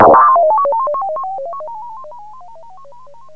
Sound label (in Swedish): roboten, robot, Bass; Giga Samples Collection